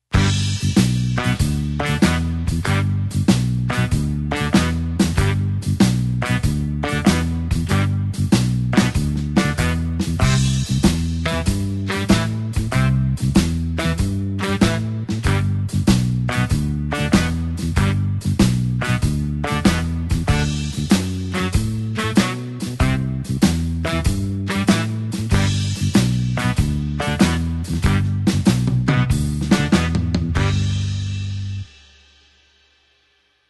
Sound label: blues horns shuffle G